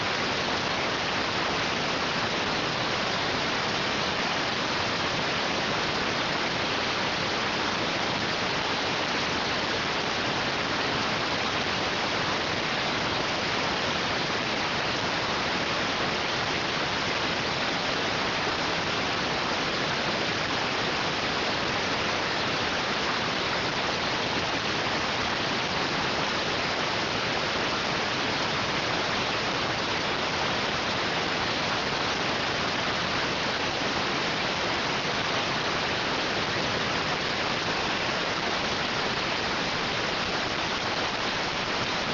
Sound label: rtty rx